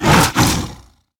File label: bear attack; druid henge